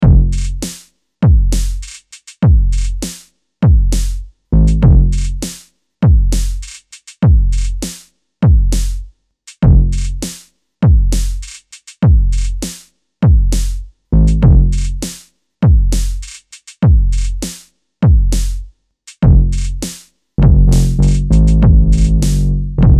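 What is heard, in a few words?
orange 100BPM, Loops und Beats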